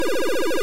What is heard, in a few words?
laser